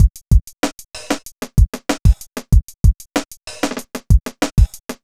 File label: kit; Sound Effect Library